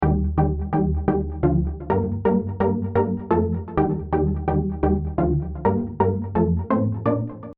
mega lead filtered

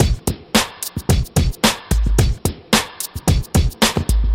Beats

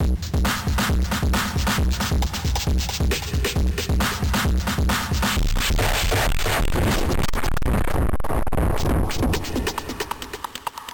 Dirty Echo Beats

